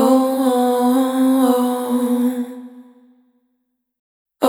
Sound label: Multi-Voice Loops, Vocal Samples; Surfacing; Sonic Foundry (Sony Creative Software) - Pocket Diva World Class Vocal Samples